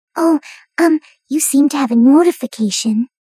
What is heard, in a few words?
notification